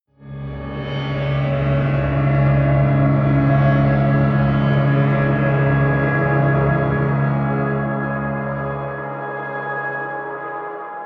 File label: atmospheric drone pad sub; musikelemente; drones